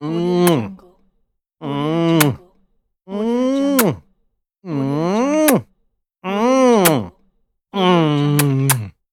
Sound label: smack kiss